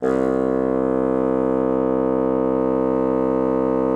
bassoon, C2; Xsample VOL-6

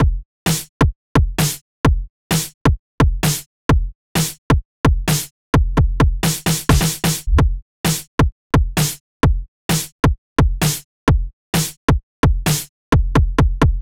Kick Snare; Sonic Foundry; Sony Creative Software; Afterhours EDM

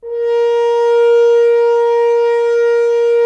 Solo F.Horn, Roland LA Composer

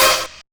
LOONY BIRD, RAVIN HITS; Spectrasonic Distorted Reality 1

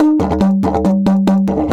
140, DIDGE RIFFS

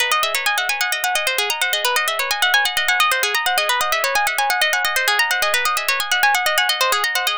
NRG Arp, trance

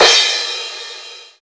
TR-909 Crash; Roland BK-5

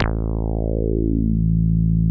TB 303 BASS

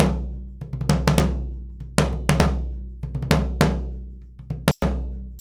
SURDO, Modern Percussion Loops; Soundscan vol.56; AKAI; SURDO 100

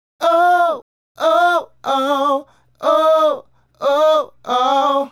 Male Group, Hip-Hop and RnB Vocals

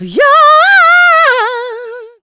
"YOHAAAHANN", screams, funky vocals; AKAI; USB Soundscan vol.18

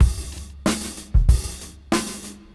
Funk 94 BPM; AMG - Keith LeBlanc Phat Butt Jams

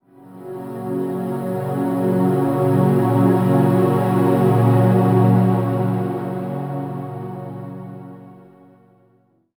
Vox Orch Chords

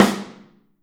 SN OH R, Kit OH Mic, Drums Percussion

